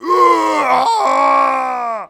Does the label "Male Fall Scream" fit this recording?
Yes